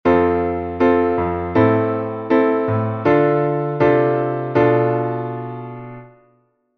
Bm7♭5を含む進行

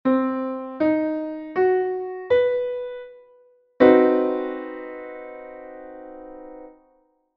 CdimΔ7を聴いてみる

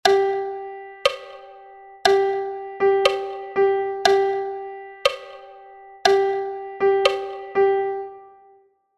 2拍3連よくある間違い

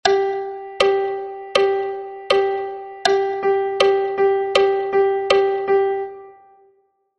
8分音符

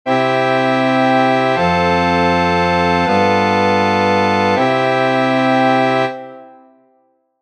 属九の和音

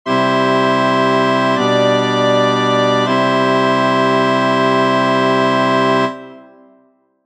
転回形の使い方 和声法 第1転回形

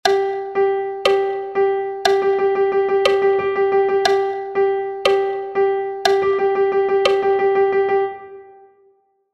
3連符（8分音符）

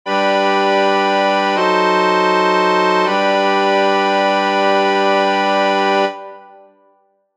属九減7の和音inG

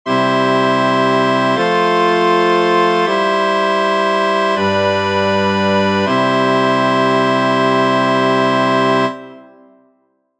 開離配分のカデンツ

